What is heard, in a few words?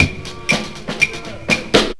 wood block fill, breakcore Breaks